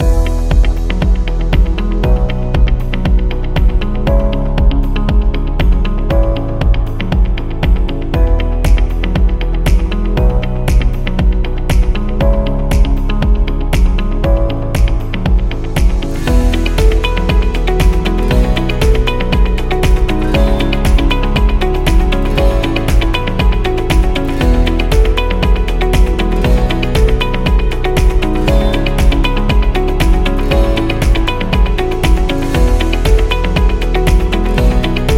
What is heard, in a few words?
Business Corporate Loop; technology